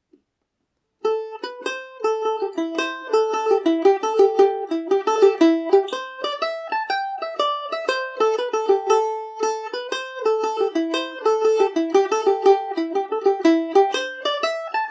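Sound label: A dorian, Irish mandolin; behind the bush in the garden revisited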